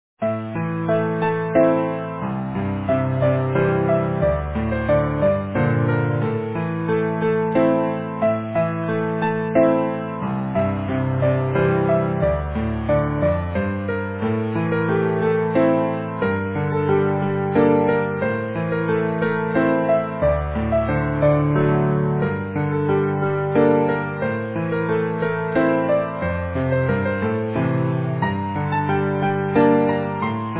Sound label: easy piano